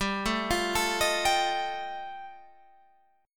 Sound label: G 7th Suspended 2nd Sharp 5th G chord